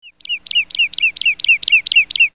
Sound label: bird